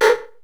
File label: 808 CRM, 480L; Wizoo; Platinum 24 Electronic; 480L BW 808